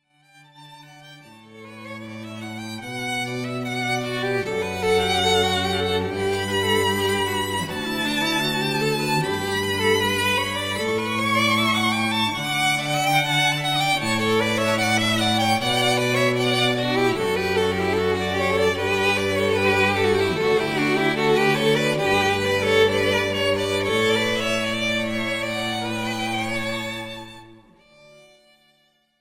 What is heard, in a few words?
Canon In D, Strings Trio